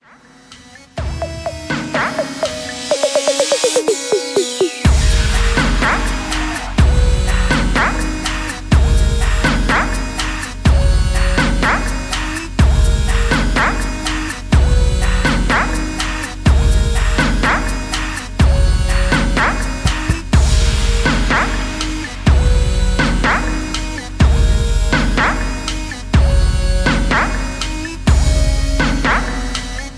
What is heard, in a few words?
124 BPM